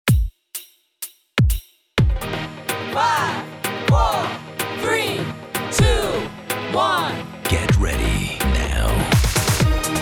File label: Arabic Style, Interval Music